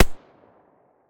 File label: silencer mono distant, weapons